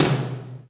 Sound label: Roland U110 Drums B1; drum machine